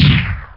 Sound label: stomp sound; bomb omb